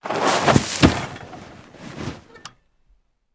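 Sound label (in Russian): рухнуть с сильным грохотом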